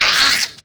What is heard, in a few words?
Fiend Hurt